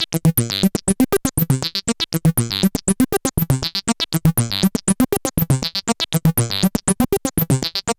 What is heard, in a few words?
Synth Sequence; Sonic Foundry (Sony Creative Software); Prototechno